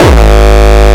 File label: 125 g sharp, kick